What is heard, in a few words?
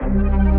Ambient; Ambient Music Pack Vol. 11